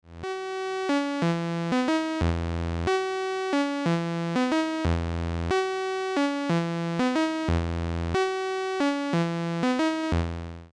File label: Behringer 2600 blue 1 VCO wet